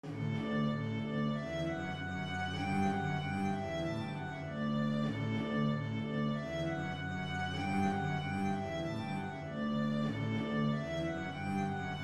sara, string bass

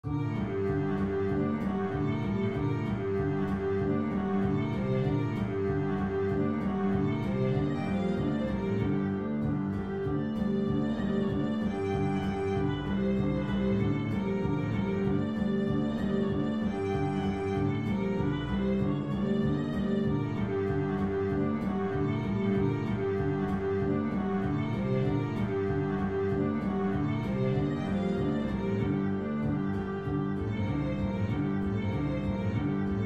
nahawand, string bass

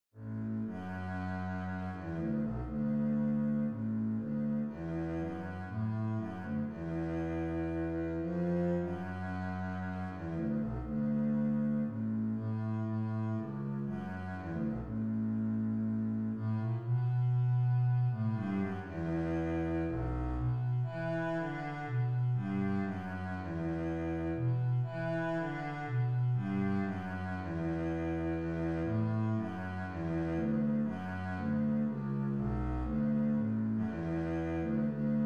concerto b minor bass

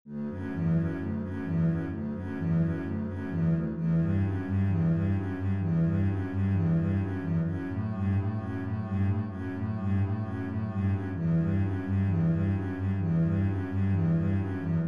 bass etude

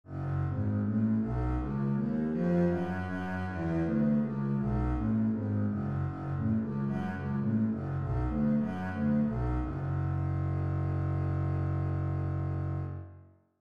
bass F sharp minor

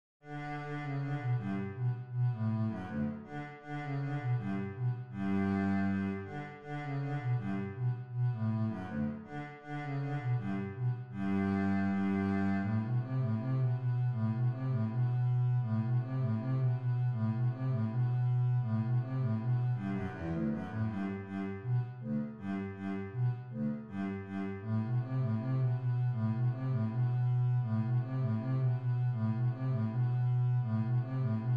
halling bass, string bass

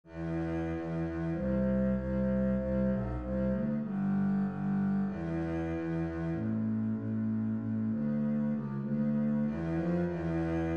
andante bass; 3 5 rosamunde

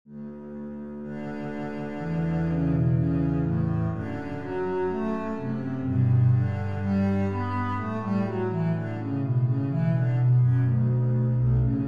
bass D major scale duet

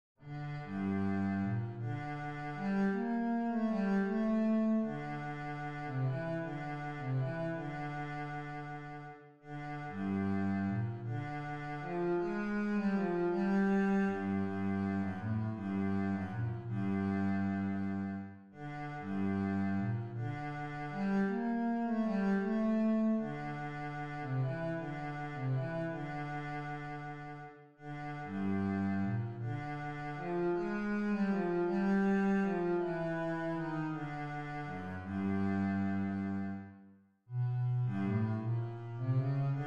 sicilienne bass, string bass

